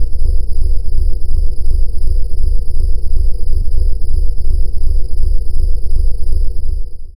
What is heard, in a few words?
pulses